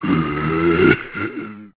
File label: zombie die, male